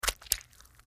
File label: MedicalScientific; Soundfx III